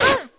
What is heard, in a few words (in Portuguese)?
Dano